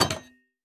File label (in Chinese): 铜门开关声